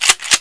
cock; wartso; weapons

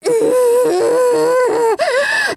melee; sven; gonome

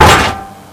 ladder hatch place

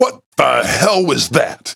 die; sven; ssam

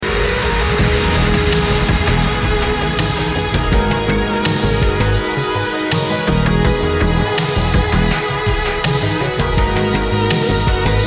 sensual

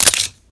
item get; sven; cof

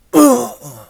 death

